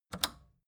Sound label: record player power click off fast